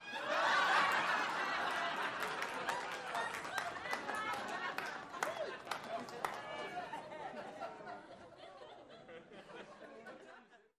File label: Crowd Short Laugh, small int